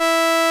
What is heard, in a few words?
SOLINA, String Machines